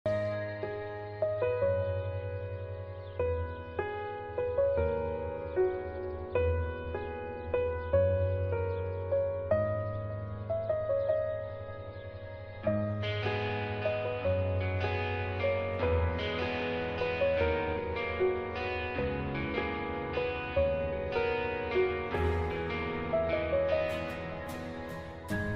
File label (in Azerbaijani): guitar huzunlu duygusal; turk serial